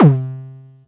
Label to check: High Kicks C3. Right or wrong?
Right